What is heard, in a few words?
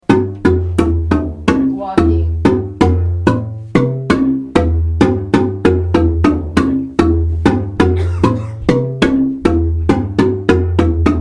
bass walking, marimba